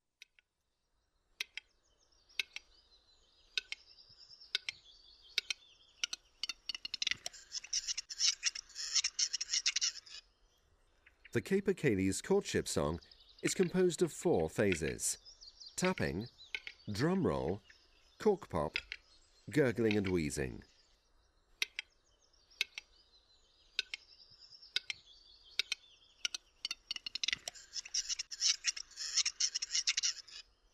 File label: capercaillie